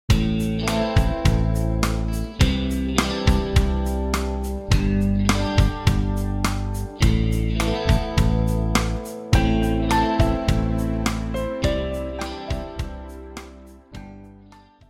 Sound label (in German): Musikstile Soft Pop Ausschnitt; Musikstile in Pop & Rock